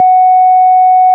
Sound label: ORG, Farfisa